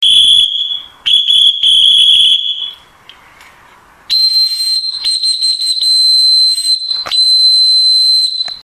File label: 2 tone comm dog